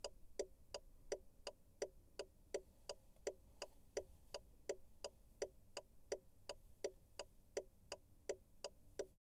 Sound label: Car Indicator Interior